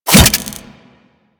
Throwing Taser Sticky Bomb Adhere; Warframe; Tenno

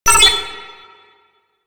Flying Robot Beep; Warframe; Veilbreaker; Quests; Ambience; Kahl

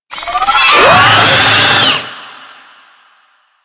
Infested Ancient Disruptor Call; Warframe

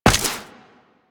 Step Ice, Footsteps, Walk; Warframe; Tenno

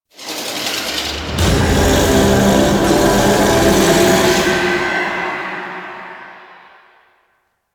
UI Area Cleared Final; Warframe; Entrati; Swarm

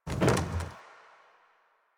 Pylon Plastic Roll; Warframe; Nineteen Ninety Nine

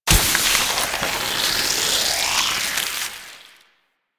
miasma impact; Warframe; Saryn

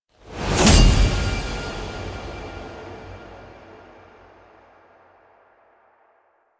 Sword Ground Stab; Cinematics; Shrine Intro; Warframe; Sacrifice; Umbra

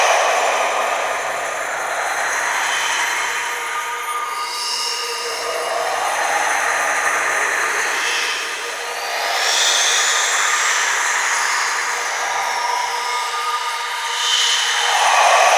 Ghost Pedestal Loop; Warframe; Ambience; Duviri; Bombastine Encounter

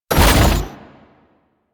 Flak Cannon Charge; Warframe; UT Flak Cannon

